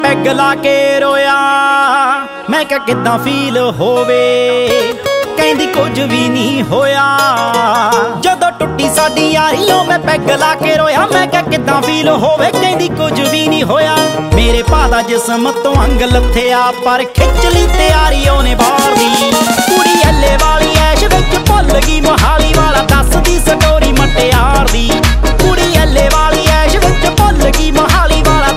Punjabi ringtone

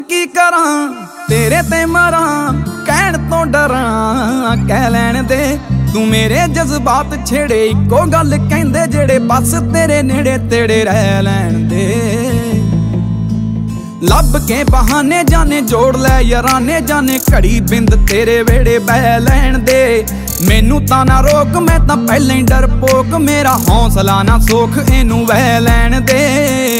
Punjabi sad song ringtone

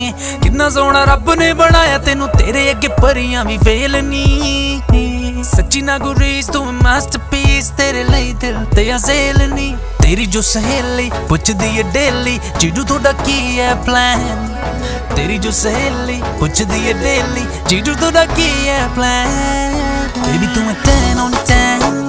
Punjabi Song Ringtone

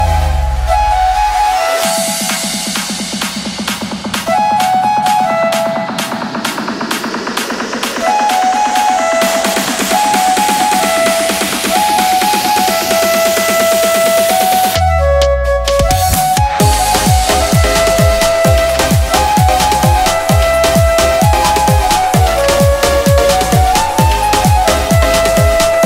Bollywood Instrumental

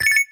Notification Ringtone

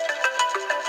ios sms Tone

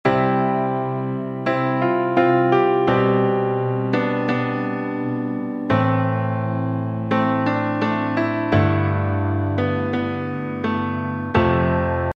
Classic Piano Ringtone

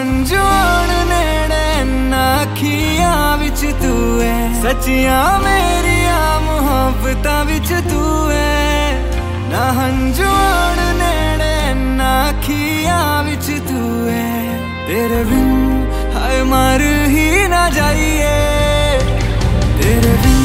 Punjabi Ringtone

